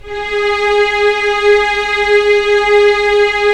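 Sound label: string section; Roland - LA Composer vol. 1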